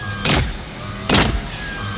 FACTORY SL, HYDRAULICS; Northstar; The Wizard VOL-1

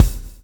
SWNG

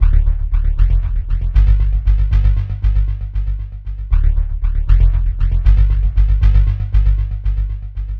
Bassline; Basslines; Drums & Drones

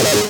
SYN DANCE; Sound & Vision - Gigapack II

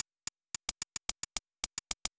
Fill, Drums, Eclectic Electronica

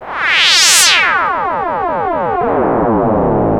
LFO Impact, Analog; Industrial; LFO FX

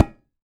BANYA MUTE; AKAI S6000; WorldSounds

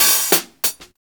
REAL, REAL DRUM LOOP; Ueberschall; Jam Box

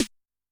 S Ana, Snare; Yamaha DTXpress-II

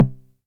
909 SD Tape

